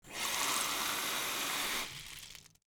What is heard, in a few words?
water glass fill